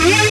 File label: synth bend, dance synth; Sound & Vision - Gigapack I CD 2 (Roland)